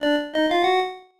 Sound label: Find Item; The Black Cauldron; Apple IIGS